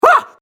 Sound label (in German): vox mann huhaa